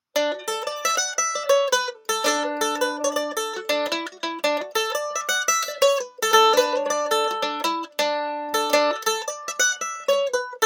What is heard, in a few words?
Mandolin Pick Comparison